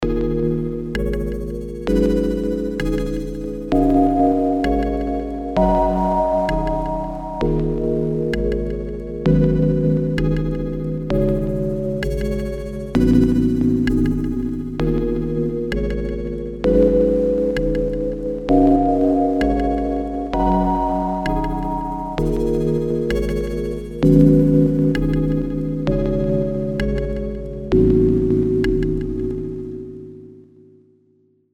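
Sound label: clicks glitches drum kit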